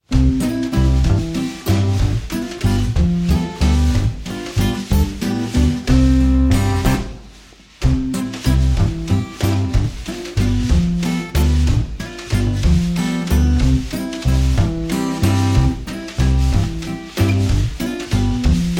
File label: mein hut instrumental